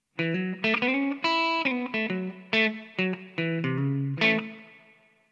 les paul reverb; koenig blue note gitarren top; Harald König

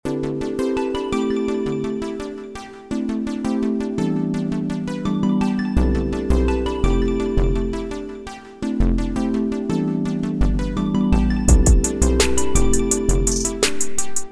r and b; MPC Beats Software; Akai Professional

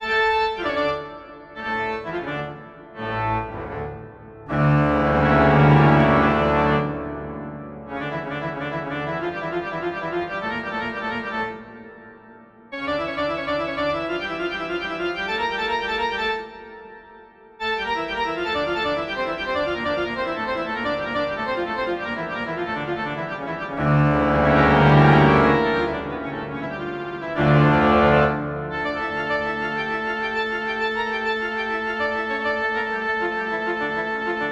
organ; uvi

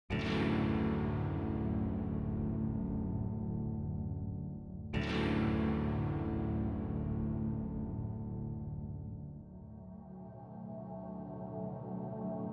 soundscapes

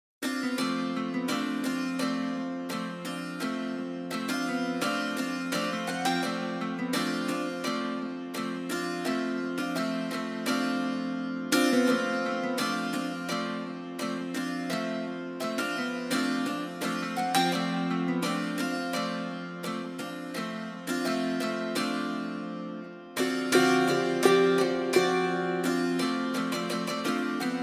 dulcimer; Mountain Dulcimer von Embertone

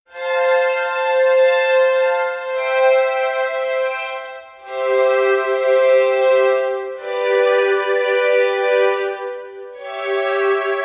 orch swarm test

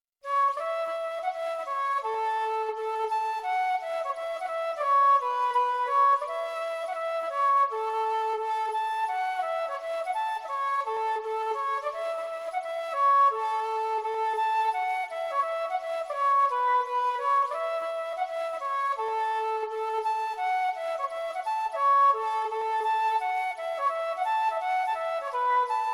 celtic era

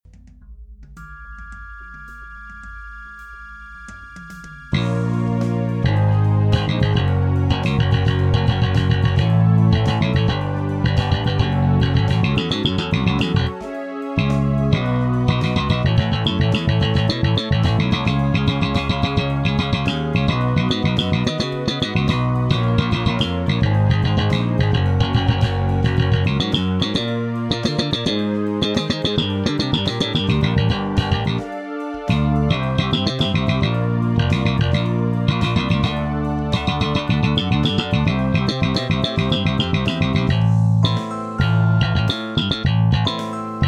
J bass slap; MODO BASS; IK Multimedia

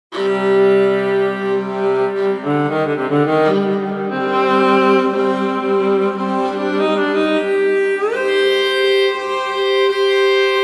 Violas

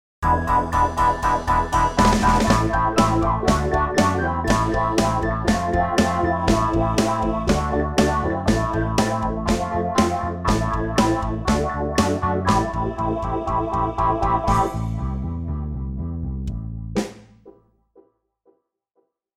Hohner pianet n; pianoteq; Modartt-Pianoteq; Hohner Collection

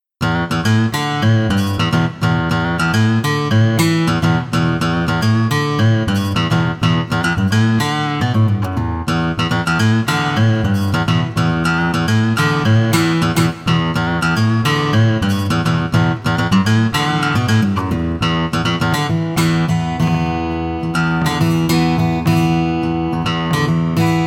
boutique accoustic; orange tree samples

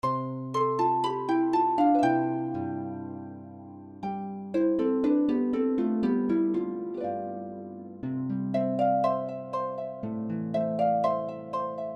harp lullaby; konzert harfe; Pianoteq; Modartt

